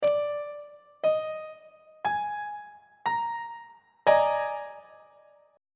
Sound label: d sharp major 7th suspended 4th 3rd inversion; on treble clef